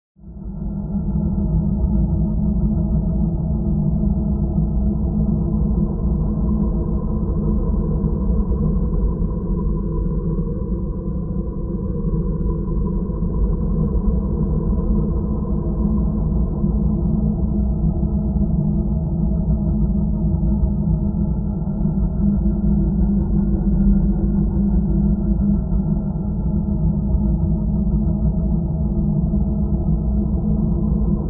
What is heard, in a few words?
Growl Ambiance, horror